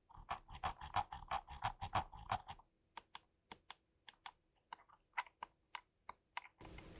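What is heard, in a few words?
Lift Scroll, mouse; NZXT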